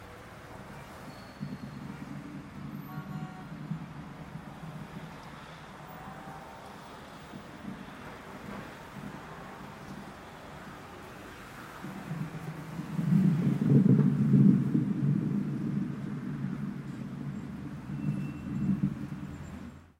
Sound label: golfball enrai